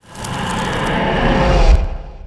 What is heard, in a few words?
Rhinoce Hunter die; Seer; GamigoNA